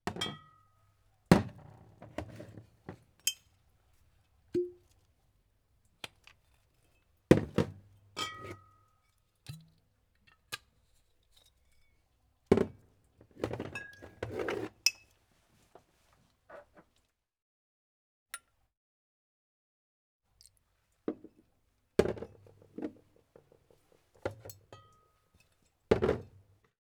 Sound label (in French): BAR BARMAN SERVICE BOUTEILLE VERSE ALCOOL COMPTOIR